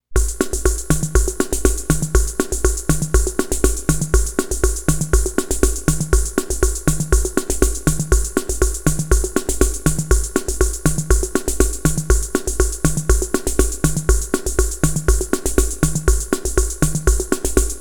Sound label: Rhythm Ace 120 Bpm Mambo March